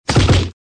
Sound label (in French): coup poing